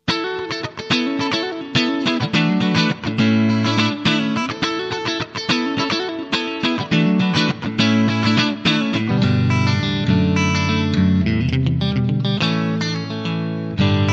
ad30vt boutique

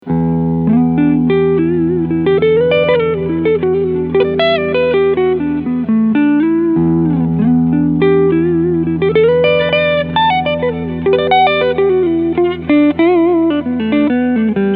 Clean Front PU

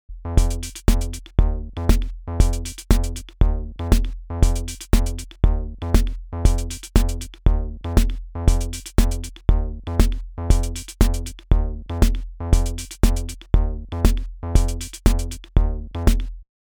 Rupert Neve Designs Portico 5017 DI

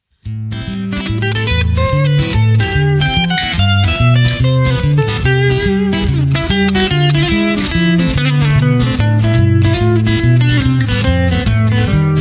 Piezo Duo; Petrucci BFR

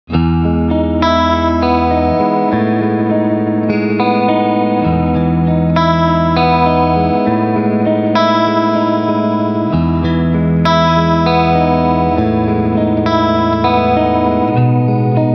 Chorus

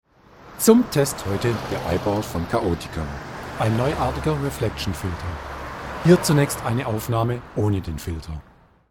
Street

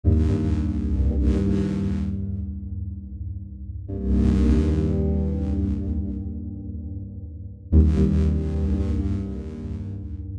Buchla Pad Playing; Arturia Buchla Easel V

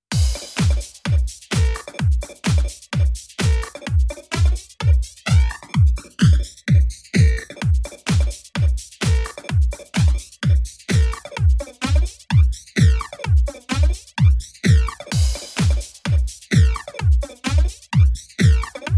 Flanger Phaser Autopan Electro

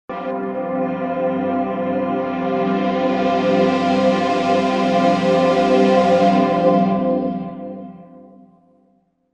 simple pad

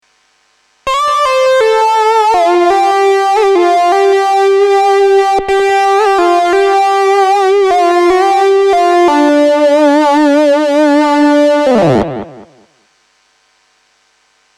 synthesizer; eventide h90

